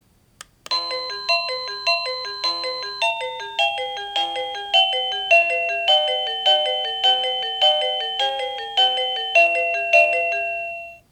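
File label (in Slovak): Bezdrôtový zvonček melódie ukážka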